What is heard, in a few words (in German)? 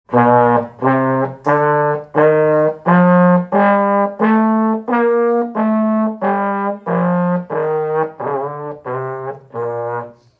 So klingt die Posaune